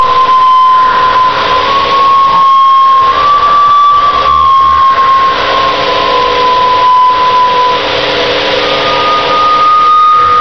Random Doppler Tones Traffic

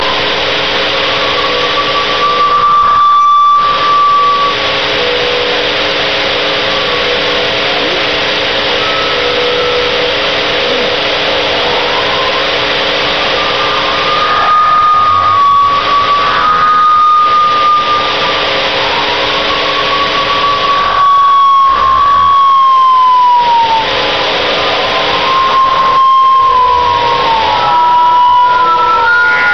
Random Doppler Tones Traffic

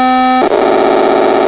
pocsag

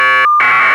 orange box, tones; 2600; phreaks and geeks

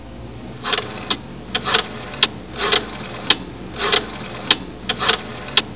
rotary, tones; 2600; phreaks and geeks

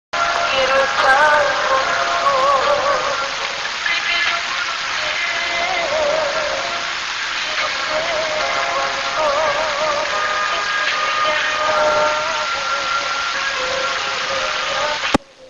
Radio Bounce 6 Inches

